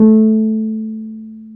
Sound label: PNO, Wurly